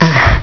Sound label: grunt die; hgrunt2